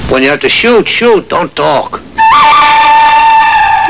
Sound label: log